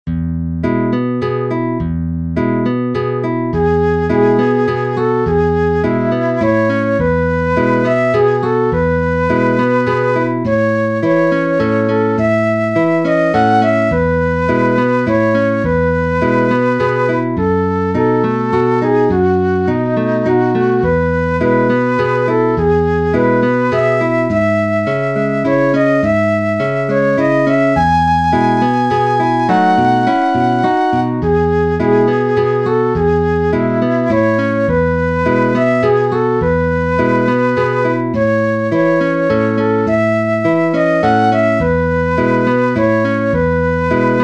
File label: Flute Guitar Duet in E Major